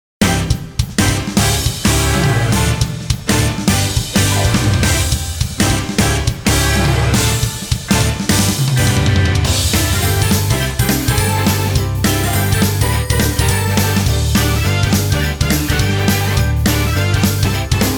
Athletic; SSB4; NSMB2

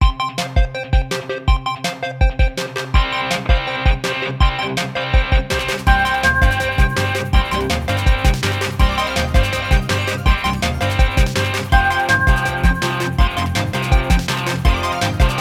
164 BPM; M&LBIS+BJJ

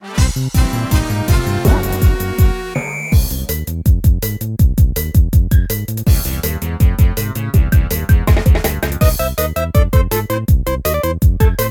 Enemy course; NSMBW

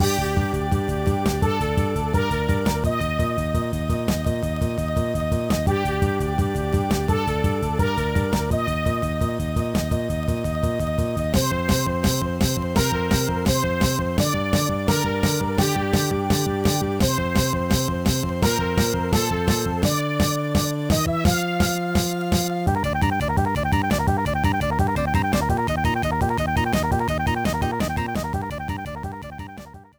Credits; Mario Pinball Land

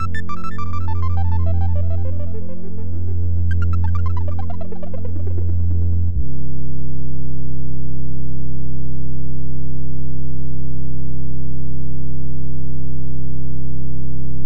Mysterious; Mario Paint

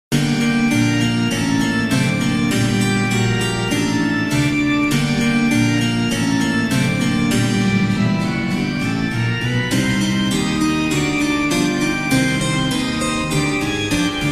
Outlandish Interruption Music

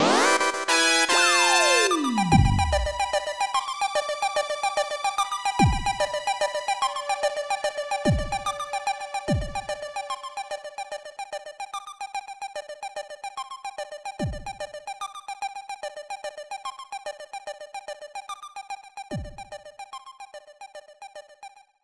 Stage Clear

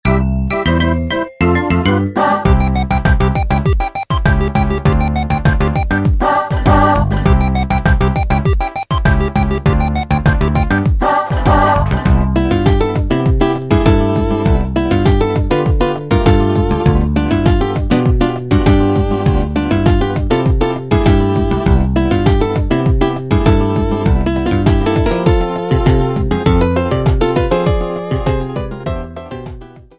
Overworld Theme; NSMB

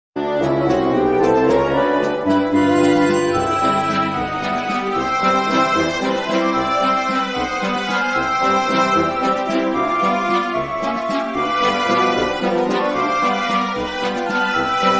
Underwater; SMM; SMB

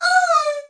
Attack sound effect

